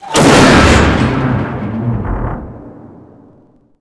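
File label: vehicle death wreck crush; WAR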